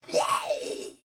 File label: Blob Death; Mage